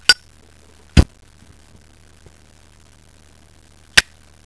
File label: lighter